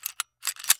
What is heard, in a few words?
gun reload